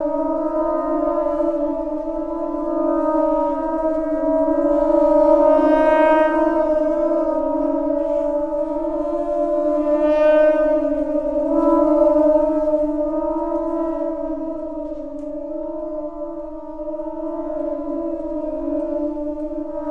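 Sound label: music, brass ambient loop; transformers war for cybertron gamerip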